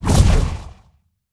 lose

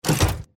flame turret deploy

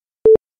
countdown beep

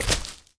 Grass step

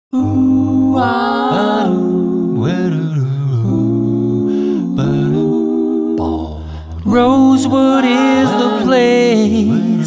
Jingle

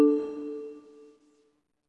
click

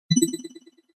Energy; basketball-stars

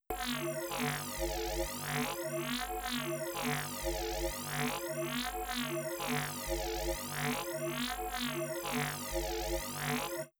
weird technology

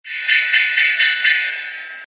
market bell

